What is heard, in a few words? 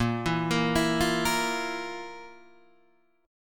F Major 7th Suspended 4th